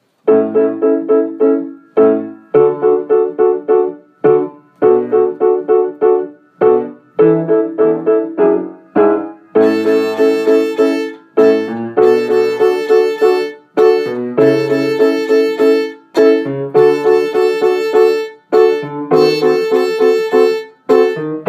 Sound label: A String Concerto